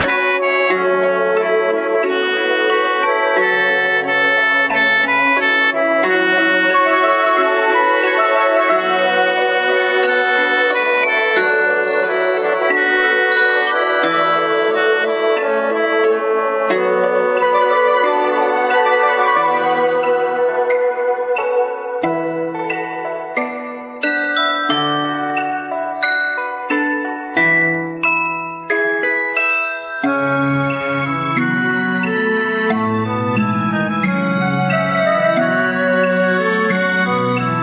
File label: Flute